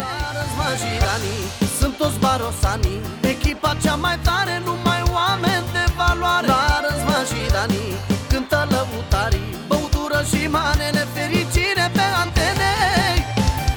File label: Ring Tone